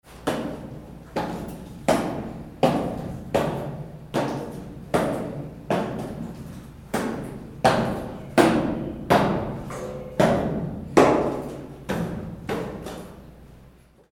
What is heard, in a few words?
ISLAND AMBIENCE STAIRS, Lighthouses; CANA ISLAND; Interactive Lighthouses